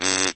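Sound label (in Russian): короткий зов оленя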